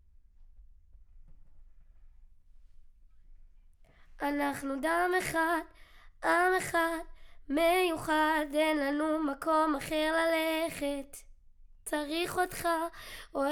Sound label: Children Choir; Studio Bekol Children Choir